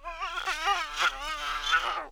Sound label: RAT DEAD